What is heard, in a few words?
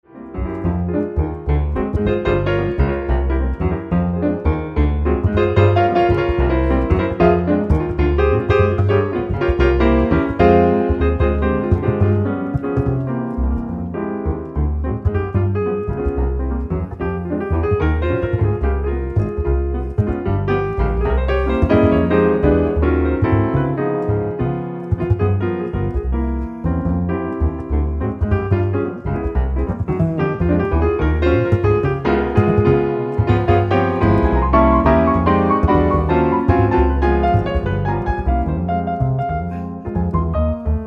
ewi piano duet